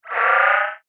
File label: Subtle Alert; science fiction sound effect; star wars / trek style games; Andrew Fleming